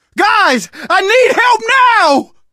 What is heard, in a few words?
dying, survivor voice, manager; source engine; left 4 dead 2